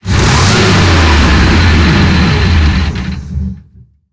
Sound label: panzer6 engine load, tanks; War Thunder